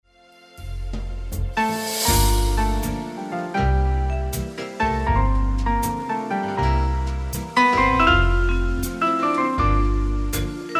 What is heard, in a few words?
piano